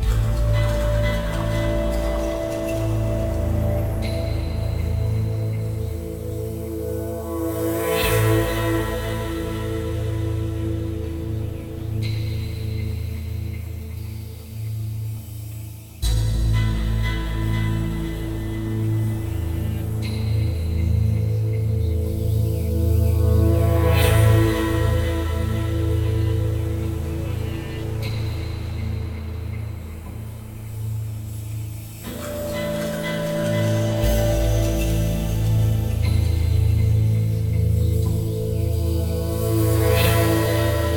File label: Music Forest